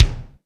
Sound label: Roland R 8 Room Kick